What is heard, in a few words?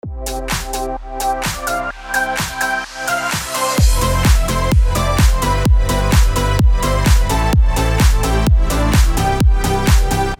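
BED HOUSE F major 128BPM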